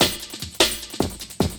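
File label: loop snare drum, 150 BPM, drum & bass; Ueberschall; Drum & Bass Resonance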